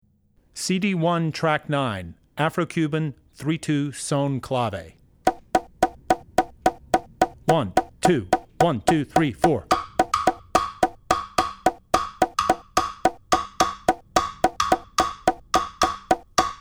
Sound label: 3-2 Son Clave; Drummer's Bible Tracks